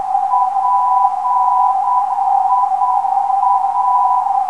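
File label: 438kHz, non-directional beacon; PIA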